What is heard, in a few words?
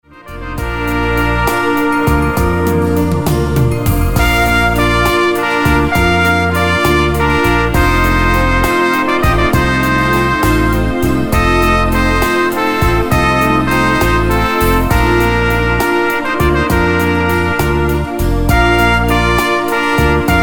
Instrumental